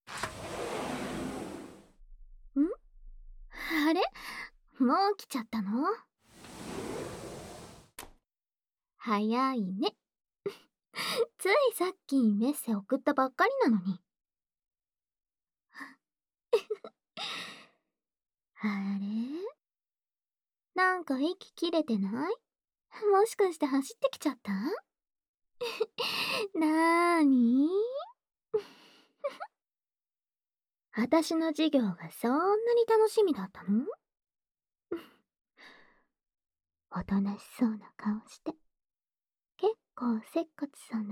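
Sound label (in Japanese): べろちゅー（ディープキス、れろれろキス）; 女の子って、みんなべろちゅー好きなんだよね♪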